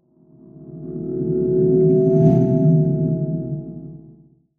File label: spiral whoosh